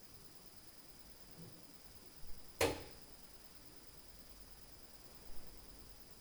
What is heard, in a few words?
TAC, Beast Noise